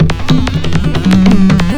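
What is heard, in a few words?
PERC LOOP, percussion kit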